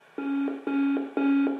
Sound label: Boop Boop Boop